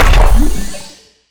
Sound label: longfall land, footsteps; player; Portal 2